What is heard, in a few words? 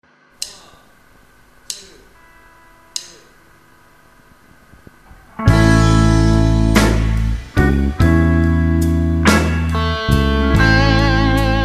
Nocaster bridge